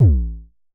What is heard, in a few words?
Festival Kicks - A1, Kicks, Vermona Kick Lancet; LookIMadeAThing Kicks